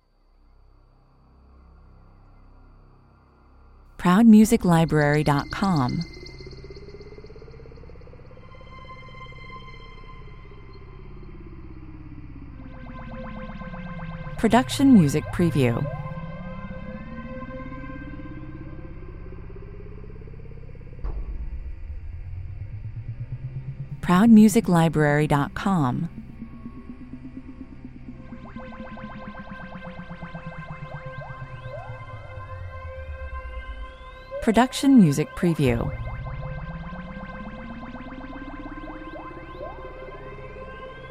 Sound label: synthetical rainforest 125 bpm